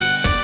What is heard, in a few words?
punch